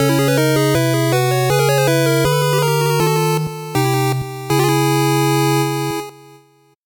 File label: 8bit jingle slow